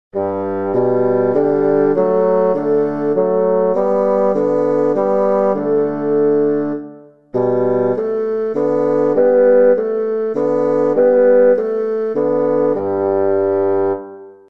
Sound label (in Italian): Fagottino